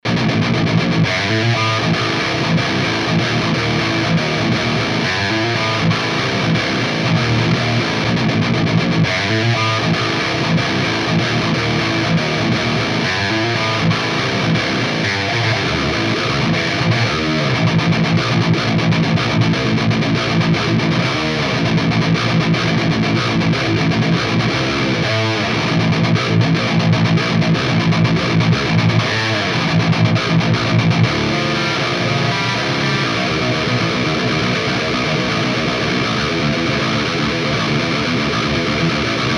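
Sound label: MESA MARS guitar, Marshall MF400 Mesa oversized cabinet comparison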